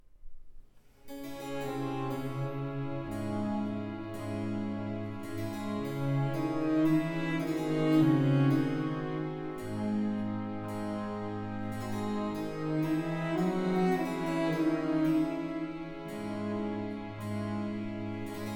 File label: Violin Concerto No. 2 in E major